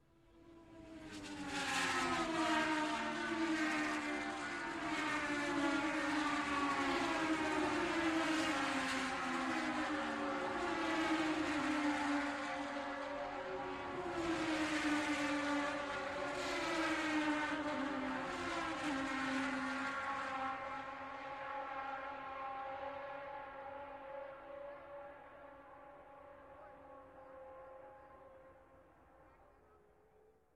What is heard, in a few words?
formula 1 car race sound